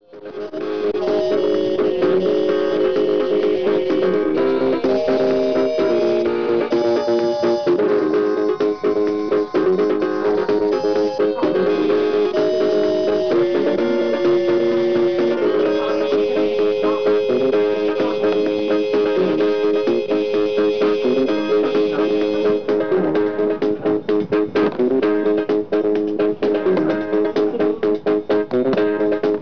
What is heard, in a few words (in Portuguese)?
ritmada